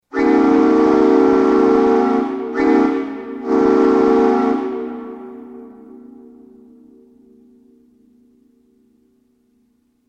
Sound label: whistle